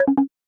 Low Battery; Pantech Burst

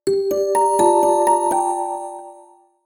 Bell, notifications; Vivo X27 Pro

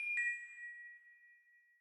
Lock

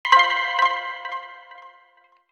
Waiting Room alert; Motorola; Titanium

